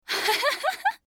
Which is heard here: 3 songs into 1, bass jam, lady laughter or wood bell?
lady laughter